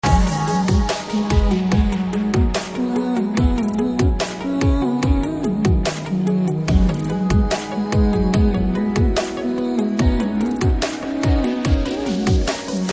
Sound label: Instrumental